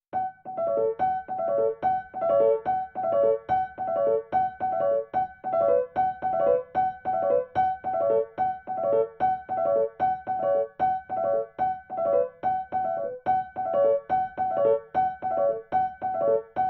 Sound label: Coffee Shop Jazz Piano; Piano bar